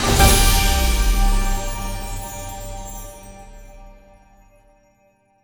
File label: big Win Stop; netent